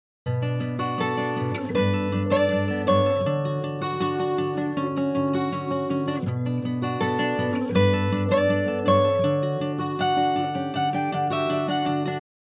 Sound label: Melody Psychedelic Before